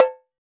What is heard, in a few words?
Tamborim, Percussion; Roland BK-5